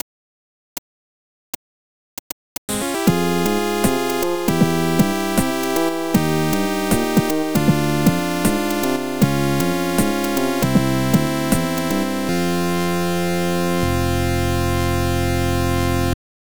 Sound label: Gameover; Cave Story